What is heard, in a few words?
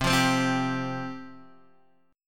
C Suspended 4th C, Guitar, Open D